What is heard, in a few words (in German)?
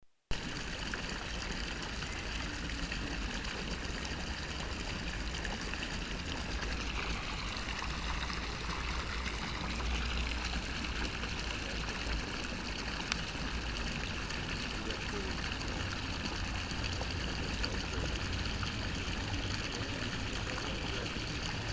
Brunnen im Volksgarten